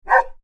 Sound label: animals dog bark med large; Dogs; Dog Bark ( Medium Large ); McKinney Sound